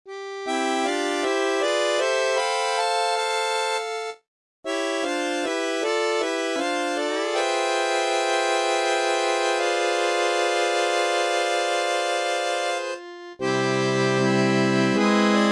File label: Synthesized audio for study; harmonica